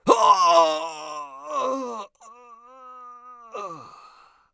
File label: agony; Escape from Tarkov; scav3